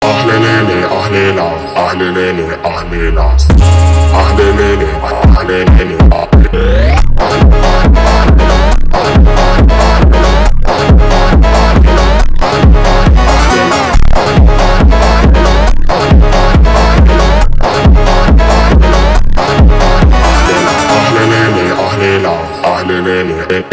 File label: funk